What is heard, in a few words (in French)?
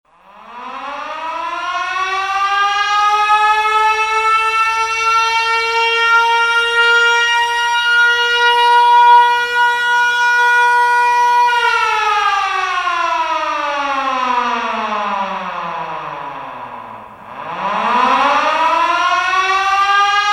Sonnerie pompier